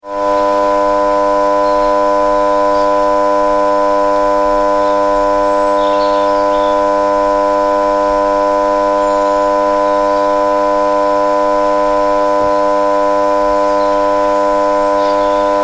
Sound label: Alarm Buzz - Electronic constant buzzing - small; Alarm Buzz Electric